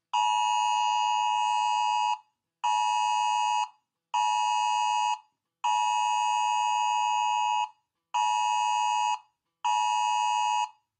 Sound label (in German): Beispielton AT Alert